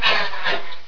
headcrab idle; svencoop

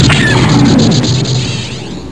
hit; svencoop

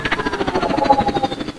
pulsecannon spindown; svencoop; hl2

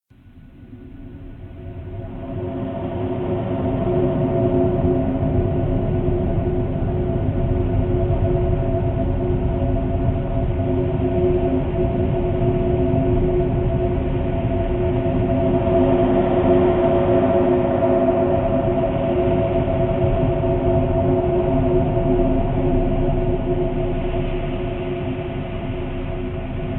rustborder loop; svencoop; rustworld

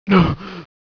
Pain; svencoop; Roy

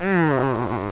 zombie pain; svencoop; biglolly coop

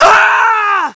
death; svencoop; scshogo

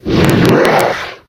overlord wizard attack; svencoop; custom monsters

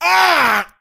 pain1; svencoop; st allies; crimson commando

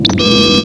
tech; svencoop; scshogo

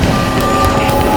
flash; svencoop; morass beta; egon

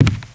grate; svencoop; aomdc

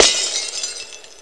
buzzer; svencoop; sence; mistake coop

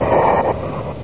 baby panther die; svencoop; custom monsters

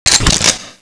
mac reload; svencoop; scshogo

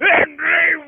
attack; svencoop; morass beta; armorman

